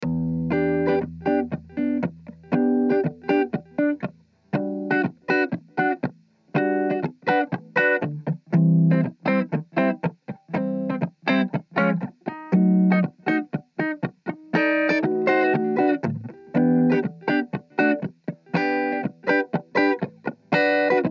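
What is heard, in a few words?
Em 120 jazzy guitar chords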